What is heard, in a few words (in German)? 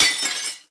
glas bricht glasflasche